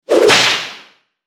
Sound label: WHIP SNAP